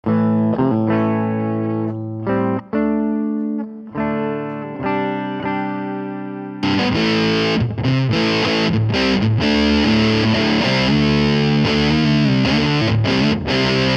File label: Medium, Mayonaise pedal